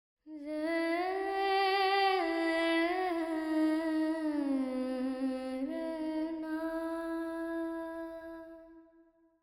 Verb 4-4 Em 70, Vocals Female; Emin

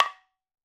madalam notes R

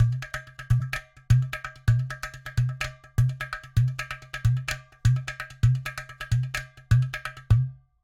Loops 128, Percussion, Ghatam; Sample Banks

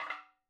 madalam notes L

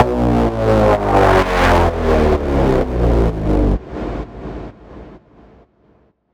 FX Downlifter; Sample Banks; Percussion

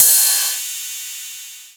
HiHat, Percussion; Sample Banks

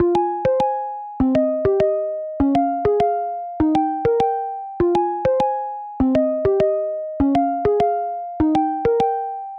Pluck Toy Fm 100; Sample Banks; Melody; Keyboards

